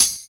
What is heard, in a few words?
subtle high hat f sharp key; hi-hat; drum single hits one shots; hat samples